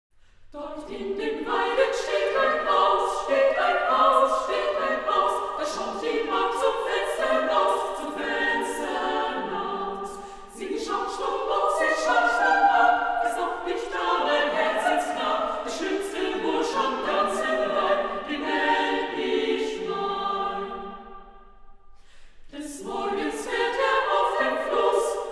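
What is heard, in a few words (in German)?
Volkslieder für 3 4 stimmigen Frauenchor Schifferlied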